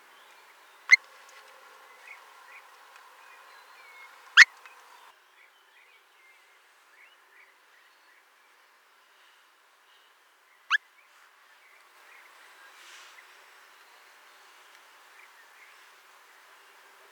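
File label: avdotka golos, stone-curlew voice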